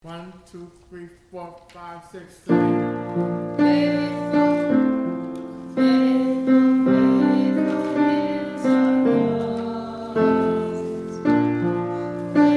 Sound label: Tenor part